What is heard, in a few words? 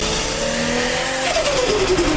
Turbo